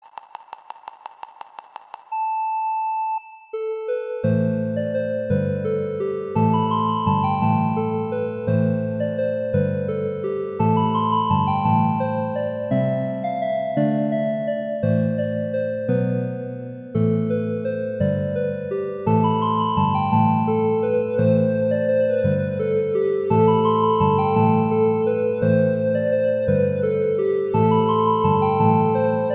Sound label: Astrosmash Beep; SoundFonts